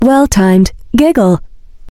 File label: well timed giggle; cyn